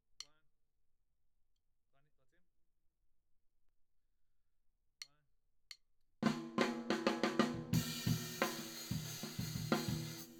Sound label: Tom